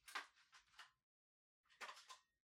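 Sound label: Ceiling Light Far remote control Battery Remove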